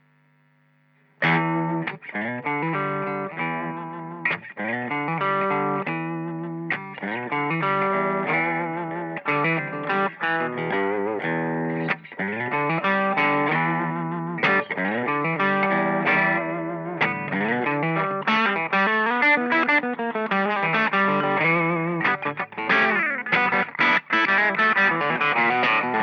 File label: p90; Stuarts